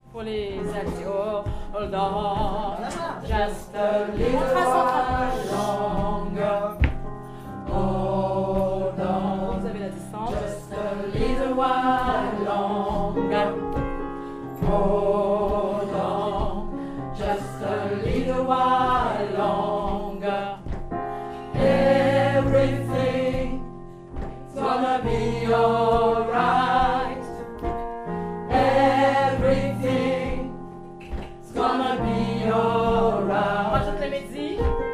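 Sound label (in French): alto homme, gospel; Hold on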